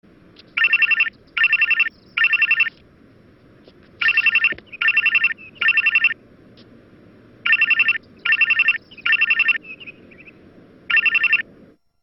Sweet Tone; Zoozoo

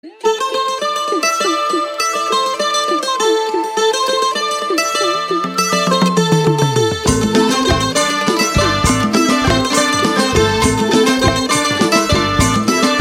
Bengali Folk

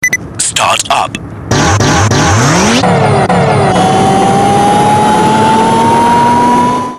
Start Up

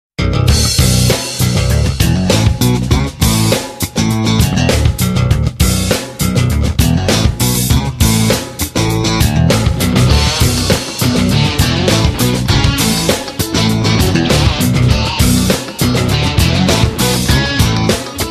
Riff

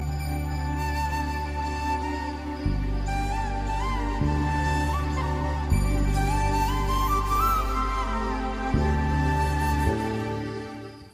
Flute

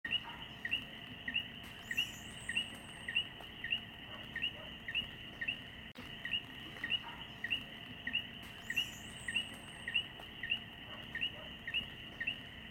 Natural Coqui

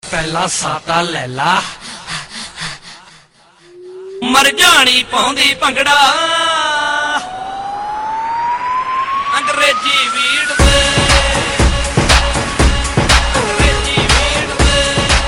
Beat soft

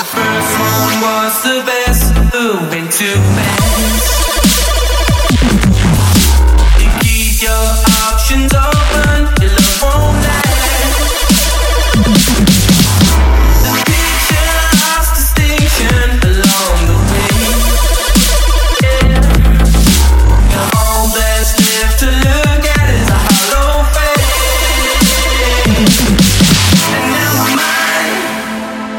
Dubstep Tone

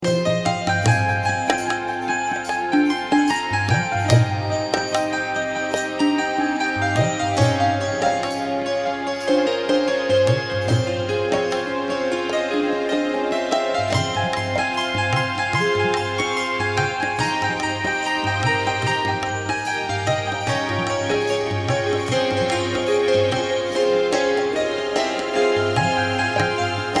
Piano Tabla Rhythm

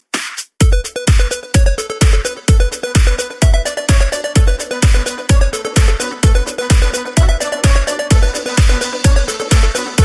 Rock Tone

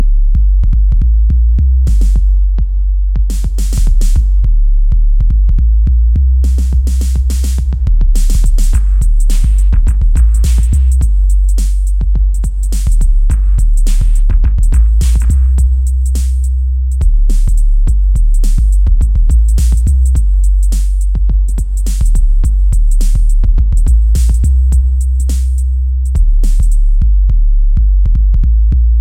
super bass

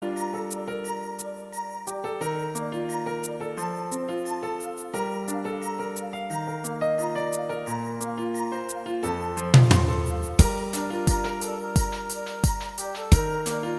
Korean Soul

